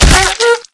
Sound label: rifle swing hit; video game; source engine; left 4 dead 2; survivor; clown